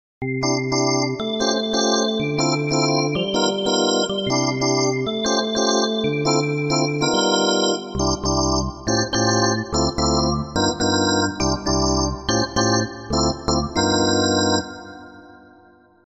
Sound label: Rotary Speaker